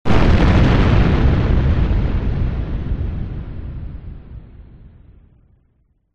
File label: explosion enhancer massive heavy ott distorted boom; zapsplat